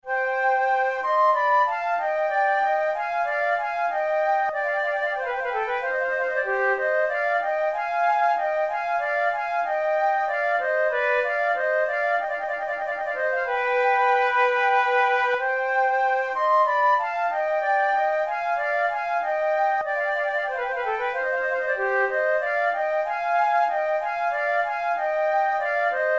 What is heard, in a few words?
sarabande; Naudot; 6 babioles